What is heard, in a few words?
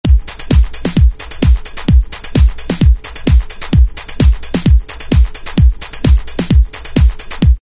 909 Pump 130; Dance; Roland SR-JV80-06